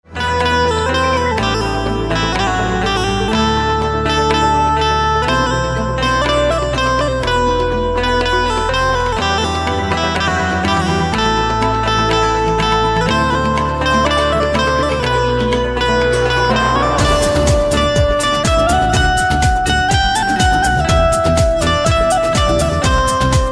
Banjo, instrumental ringtones

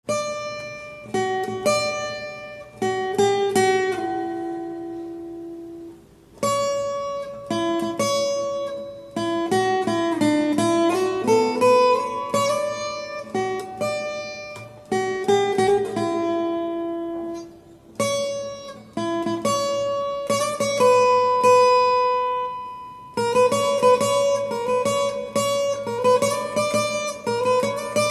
Guitar

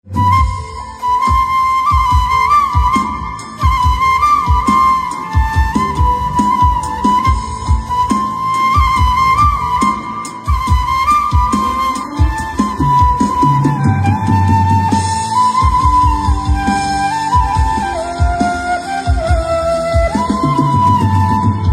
Flute, instrumental ringtones

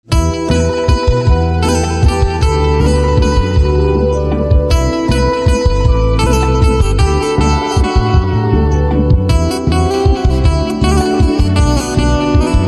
Banjo

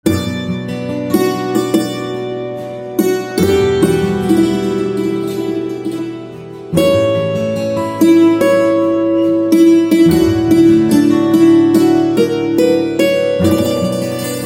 Santoor

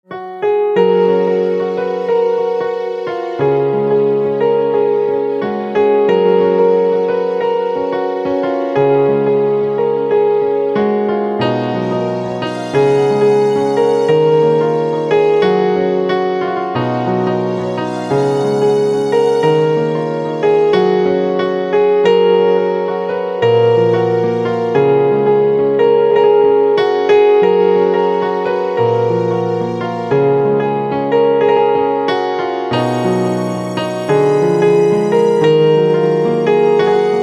Piano

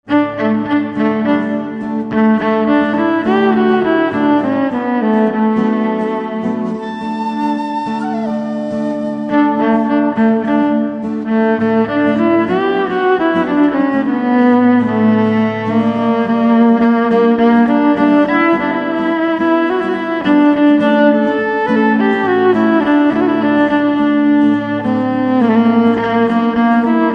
Violin

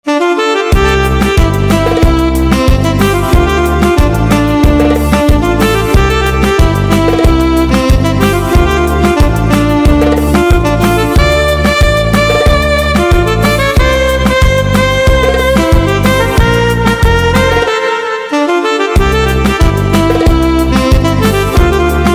Saxophone

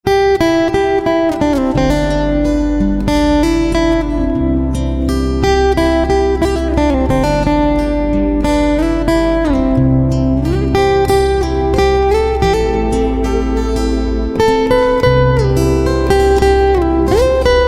Guitar

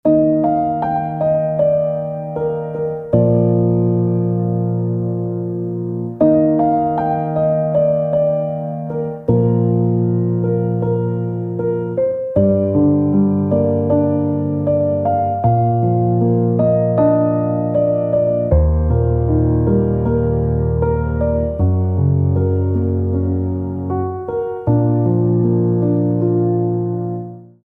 Piano Silent

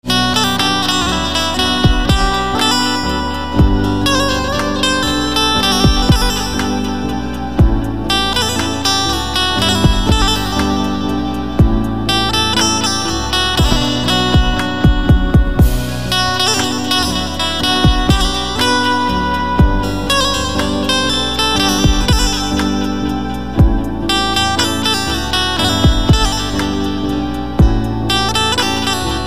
Banjo

